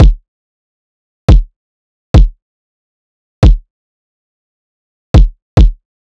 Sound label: Riddim Drum Loop 140 Kick; Ghosthack; Riddim Essentials Volume 2; Full Drum Loops